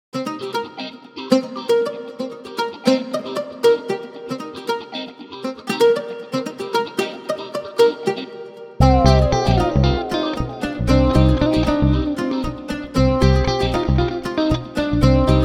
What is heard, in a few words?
Oud house